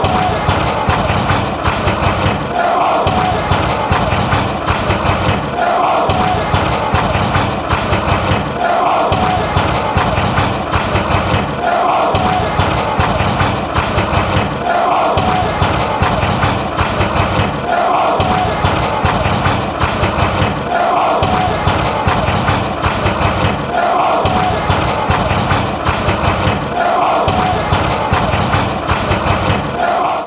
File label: millwall clap, fanchants